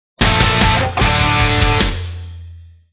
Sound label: stinger ident; reckless